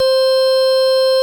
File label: VOX C4; CONTINTL; Big Fish Audio; First Call Vintage Keyboards